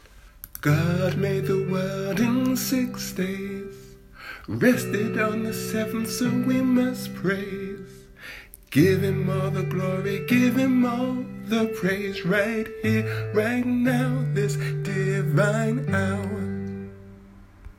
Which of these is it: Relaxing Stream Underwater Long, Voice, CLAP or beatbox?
Voice